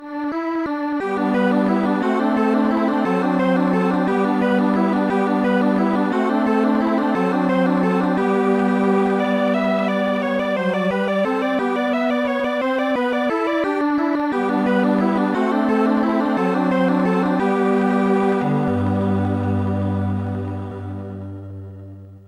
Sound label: landler; Sonix Music Driver